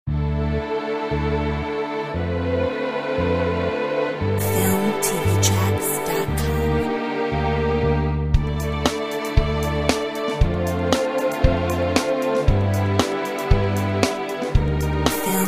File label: Corporate Attitude; Filmtv-tracks